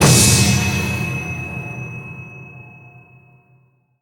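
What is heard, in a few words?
PVP Game Capture The Flag You Scored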